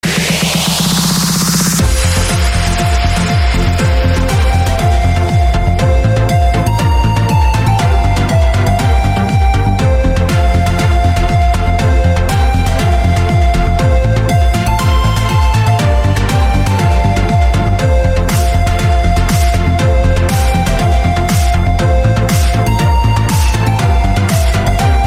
Wagner Bridal Chorus

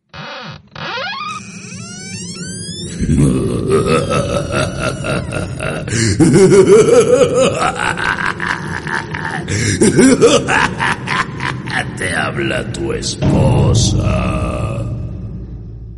Scary Open Door

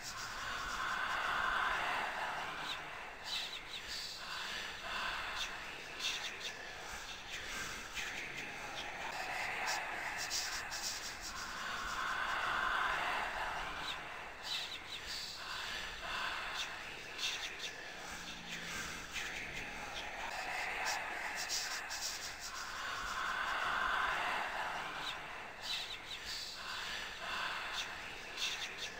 Ghostly Sound Effect

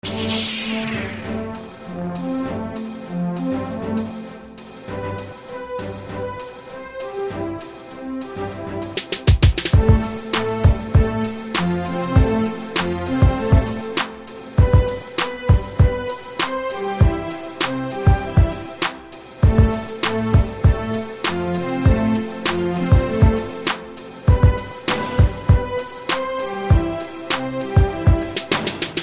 Rap Beat

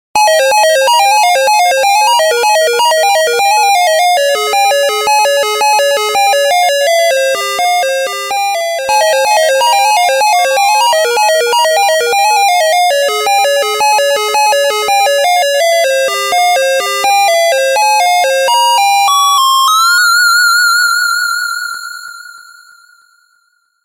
Loud Alarm

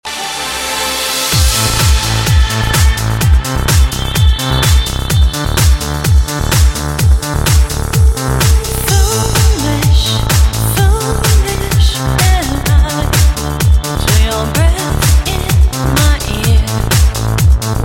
Samsung Beat

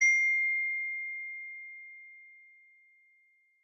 iPhone 12 Pro Max Notification

